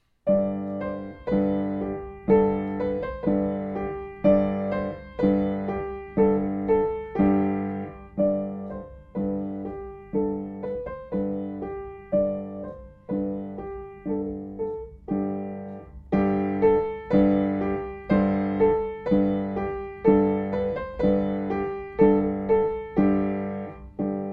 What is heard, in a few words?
bagpipe